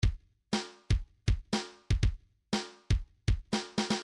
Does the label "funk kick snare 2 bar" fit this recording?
Yes